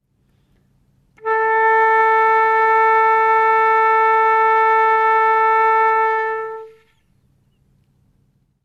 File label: Flute Single Note